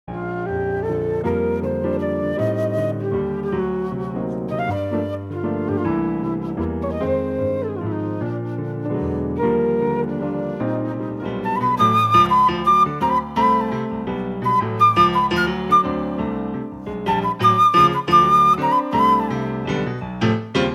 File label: Flute duo